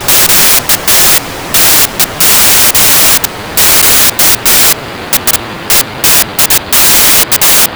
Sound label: residual Gain transf